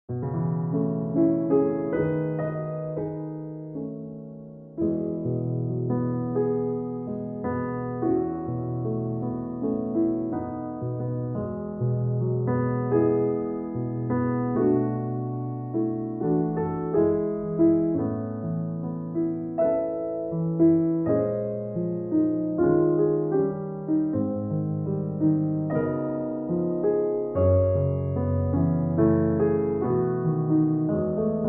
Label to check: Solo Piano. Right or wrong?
Right